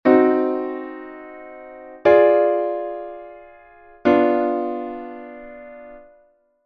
disminuidos, 5ª Diminuída, DO-MIb-SOLb; FA-LAb-RE; SI-RE-FA; ACORDES

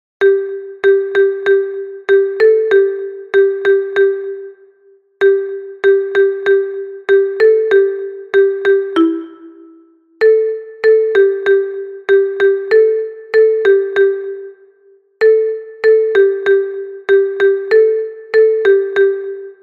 Xilofono Contralto; SAN XOAN; UNHA PEZA PARA CADA FESTA

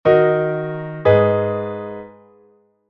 cadencia mal; armadura 5 sostidos, dous acorde: re-fa-la-re; sol-sol-si-re

